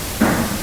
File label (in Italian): Colpo; Castello Salizzole